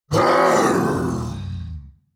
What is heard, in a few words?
Demon king lord attack shout